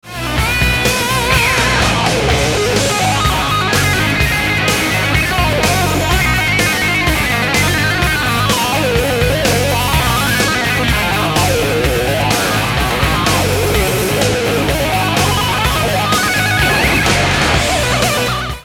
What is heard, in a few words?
legato